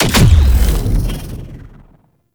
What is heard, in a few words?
Sniper Punch; Warframe; Sentient; Caliban Prime Sniper